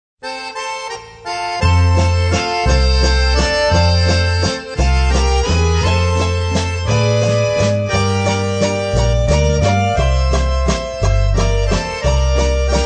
WALTZ

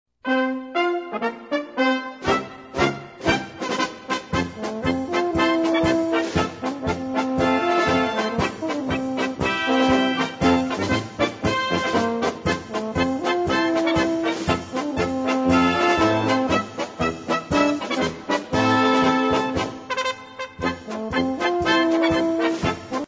polka

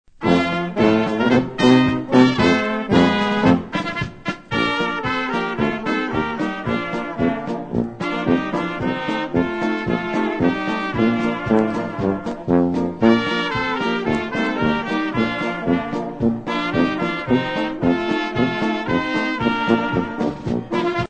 POLKA

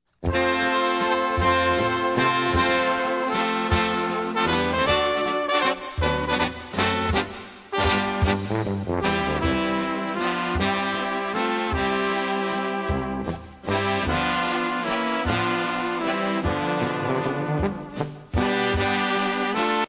WALTZ

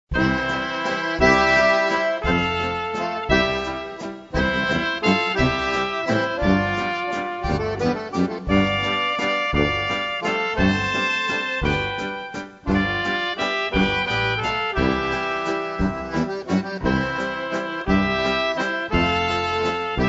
waltz

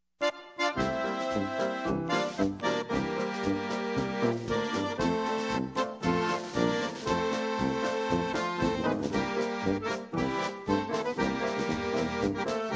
polka

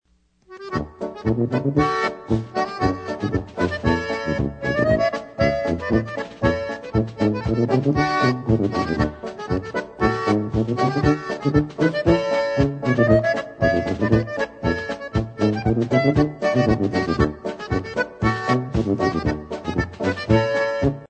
ESSIG POLKA

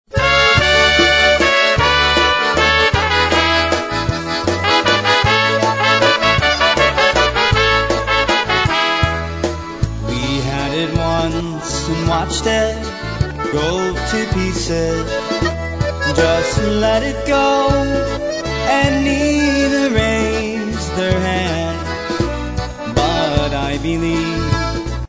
WALTZ MEDLEY

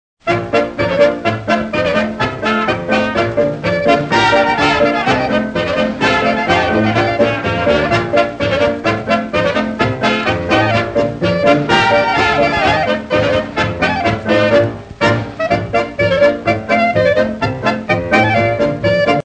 POLKA

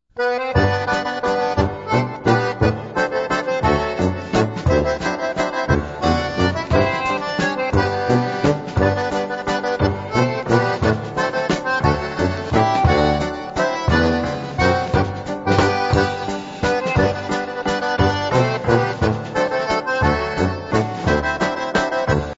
waltz

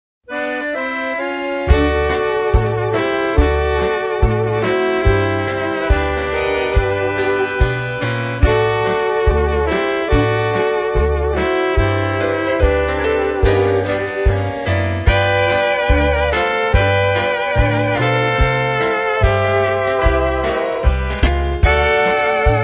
waltz

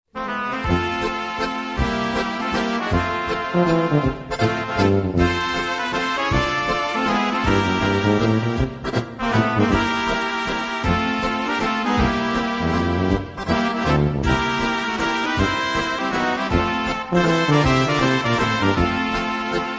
waltz

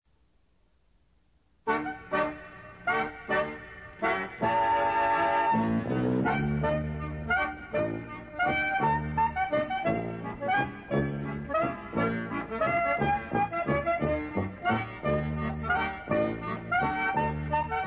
CUCKOO WALTZ

